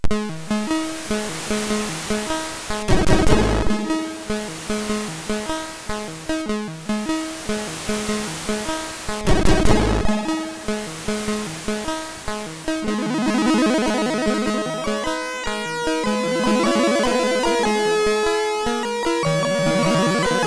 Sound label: Neo Enka MOS6581R3